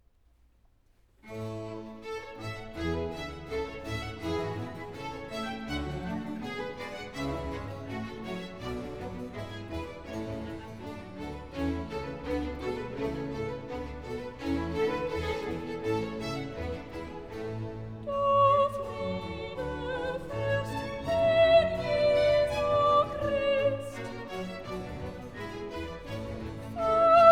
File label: Chorale (Soprano); vocal; BWV143; Du Friedefurst, Herr Jesu Christ; BACH